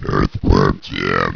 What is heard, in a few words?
Burp